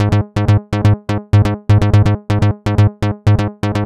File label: Ab 124, Synth Loops, Acidized; 124bpm; Zero-G; Soundclash Nu Dance Electro